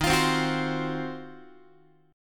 Eb Minor 6th Add 9th Eb down Guitar 4A,4E,5A,5D,5G sharp,6C sharp; strummed